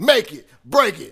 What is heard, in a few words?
Rap Vox